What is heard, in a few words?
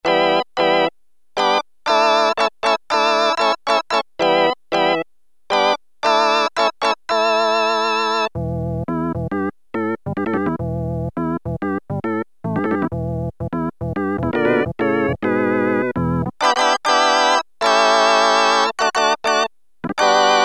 ELEC ORGAN, Casio CZ-1000; Preset